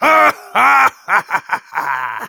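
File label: laugh evil, German; Demoman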